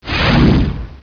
Rocket Fire; XWing

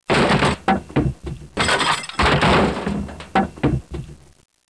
glass

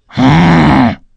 ouch; MOBICLIC41

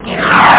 plane; amiga

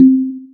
menu Enter; Geschicklichkeit; J-Ball

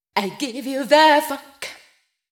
VOICE GRL HOUSE 125, House Voice; eJay

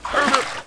DROWN; mordor

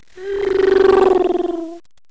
ZOOOOM

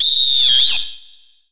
whistle; SMSoccer

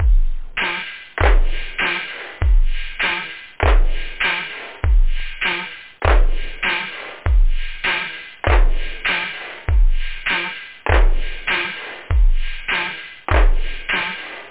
katang, loop house; da capo vol1

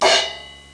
cymbal; WAV Sound Effects; music

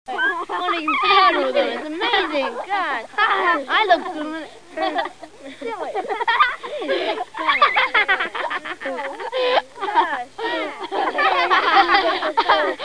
Kids Talk Laugh; How Would You Survive (1995); Grolier

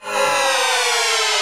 bees die; robotron

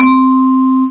vibes; Utilities Professional

